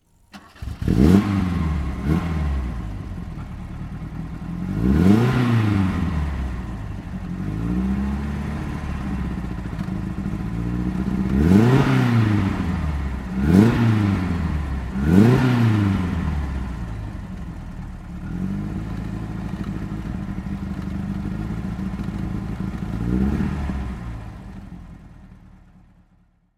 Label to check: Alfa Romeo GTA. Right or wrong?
Right